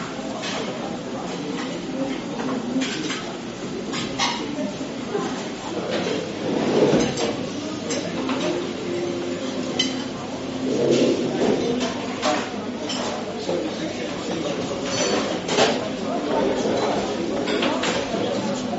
Amb Work Cafeteria

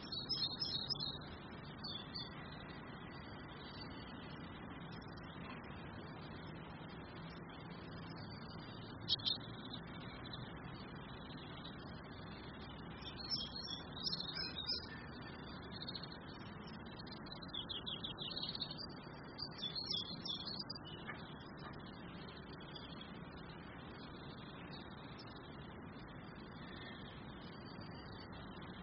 Park Ambient

